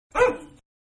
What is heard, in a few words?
Bark, Dog; Dogs; Animals; Manhattan Production Music